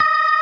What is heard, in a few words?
STOPS, ORGAN; Synth and Organ; InVision Interactive; Keith Emerson; ORGAN SPLIT